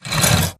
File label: Stalker Damaged Crawlscrape Concrete; Titanfall 2